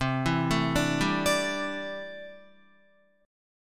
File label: C 6th Add 9th C down Guitar; arpeggiated; JGuitar